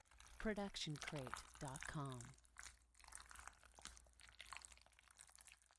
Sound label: squishy long; soundscrate